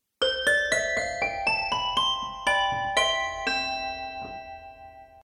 Toy Piano Grand

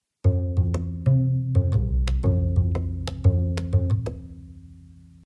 Bolon

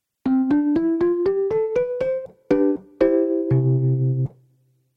Weltmeister Claviset E. Piano

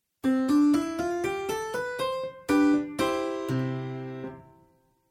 Wing Tack Piano

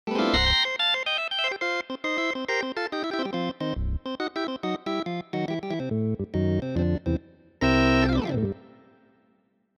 Transistor Continental

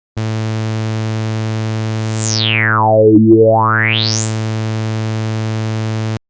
resonance cutoff

